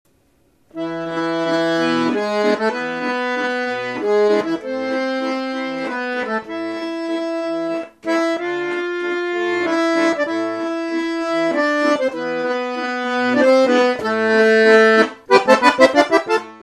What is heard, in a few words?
harmonium; danubi